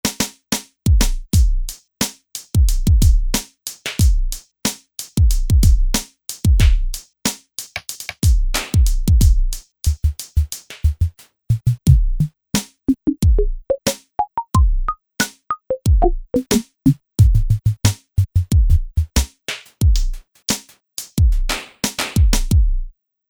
Fission Kit

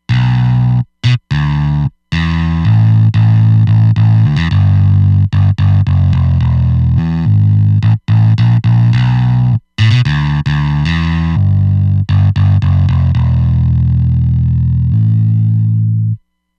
Basement, Roland Fantom XR

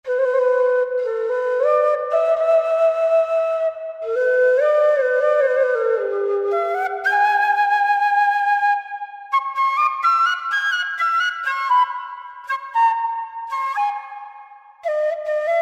Malaysian Seruling Flute Phrases; FLUTES; Spectrasonics Heart Of Asia

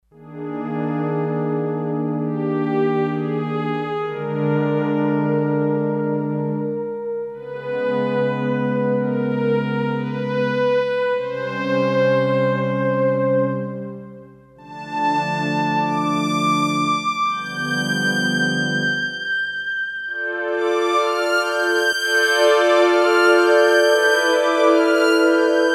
Deep Ana Strings, Roland D-10; Factory Patches

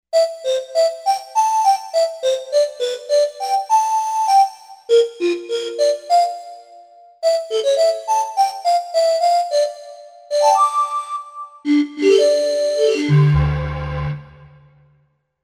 Bottles

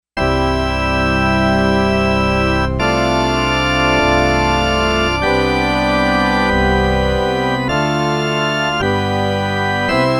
Church

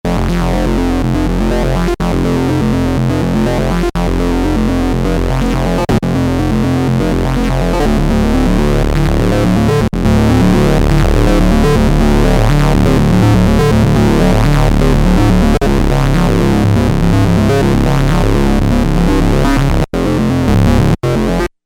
Sync Mtion

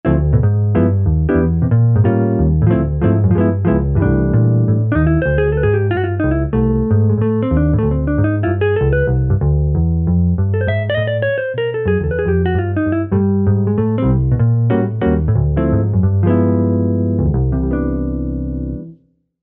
Jazz Gtr

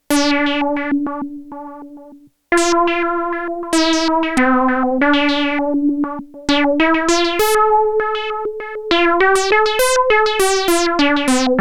PULSAR, Roland SH-101; Factory Patches

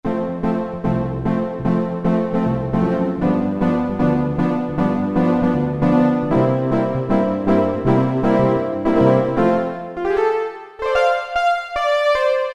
Yamaha TG33; SP-Dandy

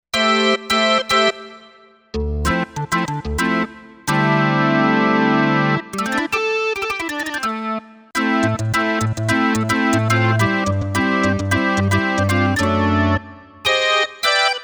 Perc Org

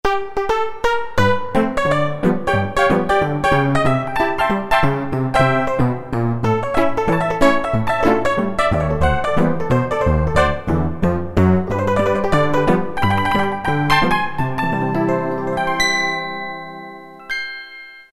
Honkytonk, Roland MT-32

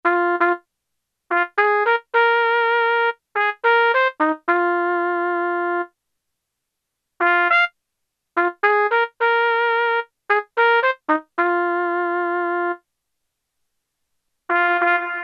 Plate; t.c. electronics M300

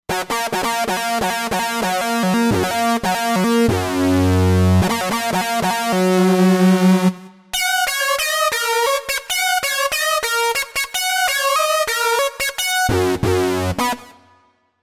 Lead Sync Lead

